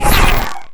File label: portal open blue, portalgun; garrysmod